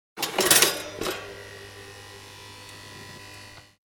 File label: Toaster On, Placing bread in a toaster and pushing it down.; Appliances